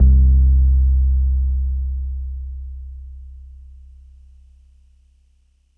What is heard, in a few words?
Sub C2